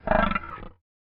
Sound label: Rugido Roar